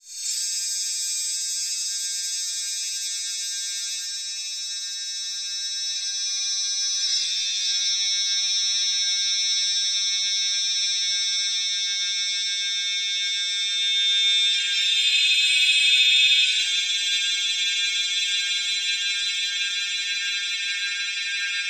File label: Transition Stab Dark Electrical; Production Element